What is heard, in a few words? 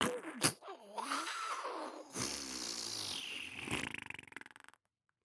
FUN MONSTER Slimy gooey choke